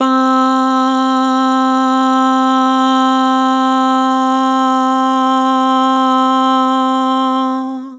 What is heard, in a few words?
Vibrato "Bah", Vocals; Harmor Vocals